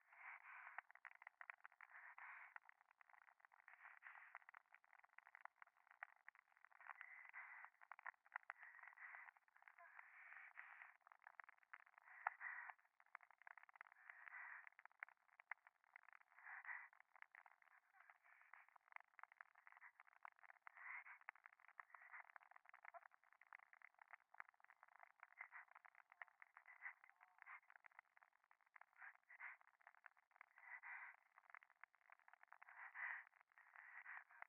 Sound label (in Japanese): 左耳 密着 吐息 暖炉